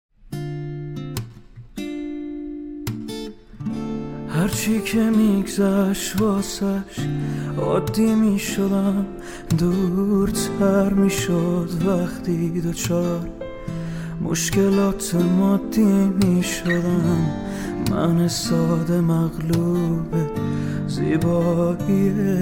Guitar

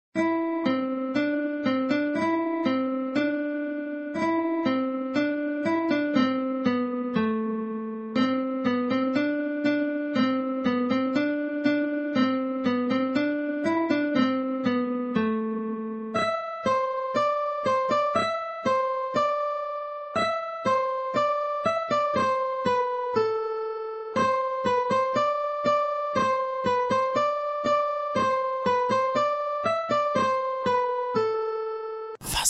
Guitar Version

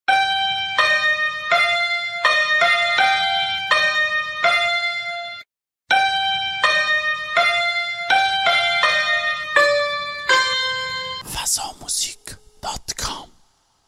Santoor Version

